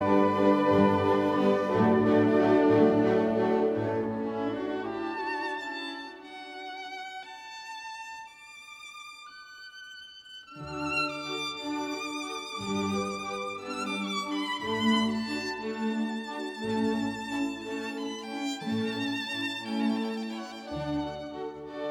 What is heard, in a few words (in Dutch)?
Adagio, vioolcocert G major; Mozart; K216